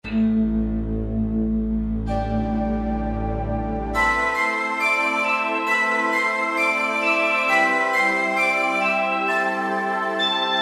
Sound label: Harpsiflute; Optical Media International Sonic Images Library